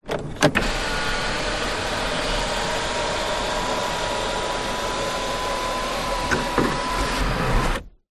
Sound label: car suv holden captiva electric sun roof close; transport